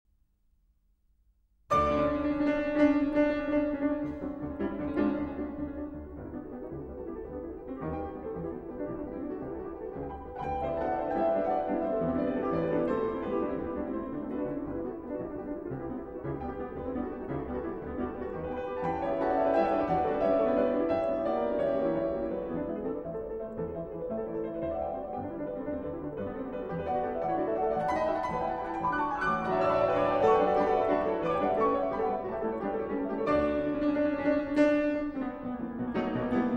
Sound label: Suite For 2 Pianos; Rachmaninoff; Op. 17; 2. Waltz (Presto)